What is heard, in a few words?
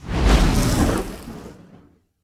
enchantment fire, weapon enchant